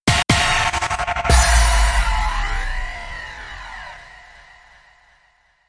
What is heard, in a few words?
Stutter Sub